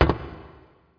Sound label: step metal amb; SpecOps2 MPlayer Demo